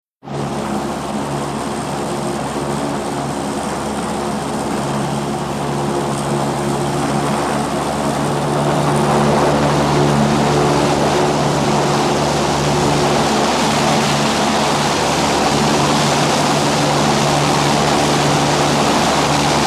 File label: UH1 Idl Liftoff Away; UH-1 Iroquois